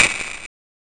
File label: Woodblock, Genesis; M8